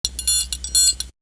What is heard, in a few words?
Percussion; Coldcut's Kleptomania VOL1